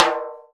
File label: snare, timbales; Univers Sons; Basicussions